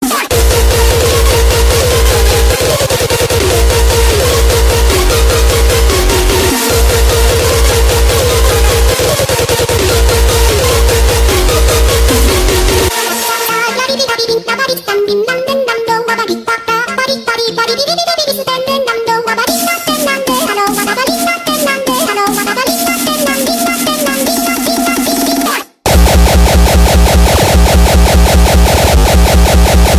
300 BPM

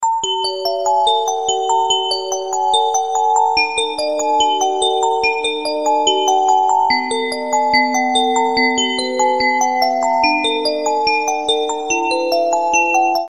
Nice Bells Alarm

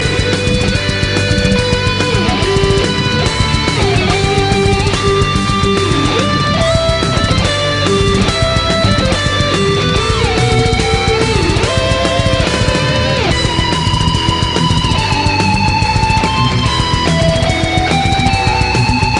Metal; Doctor Who

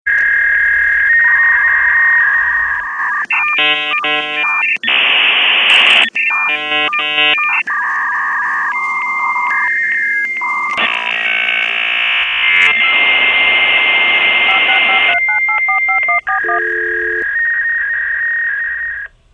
Dial Up

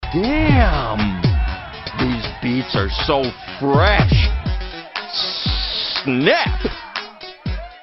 Fresh Beats; Gi Joe

